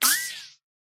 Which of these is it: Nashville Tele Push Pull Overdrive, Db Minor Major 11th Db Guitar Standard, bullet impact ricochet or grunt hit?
bullet impact ricochet